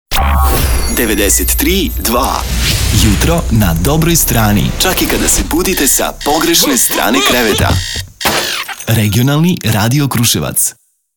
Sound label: sweeper